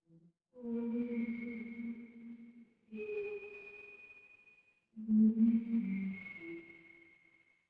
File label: ambience forest